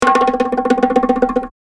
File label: Bongo Drumroll